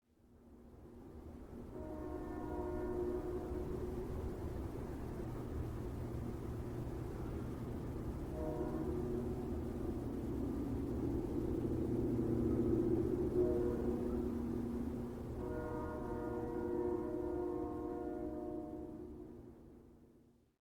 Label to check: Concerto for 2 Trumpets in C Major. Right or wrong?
Wrong